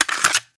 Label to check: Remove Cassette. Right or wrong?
Right